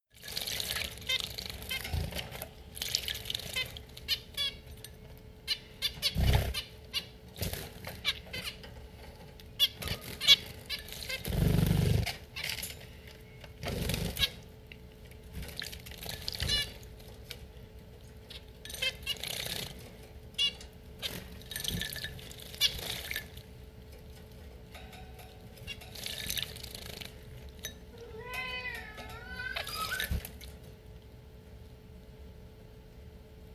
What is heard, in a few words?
Finch Bath